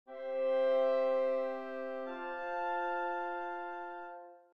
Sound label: chords